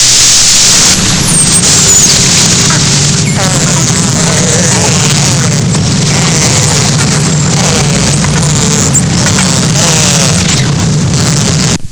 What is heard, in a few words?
Delphinus Delphis